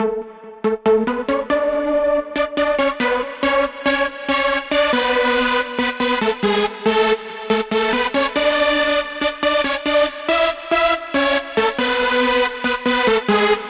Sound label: Lead High, 140 BPM; Vengeance Samples; Vocal-Hook Kits; Song Kit; Stop!